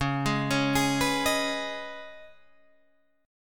C sharp 7th Suspended 2nd, C sharp, down, Guitar, Drop D